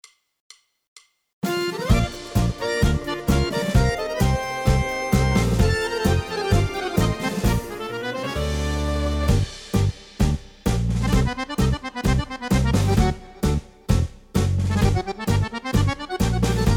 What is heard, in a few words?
Midi Yamaha; yamaha genos 2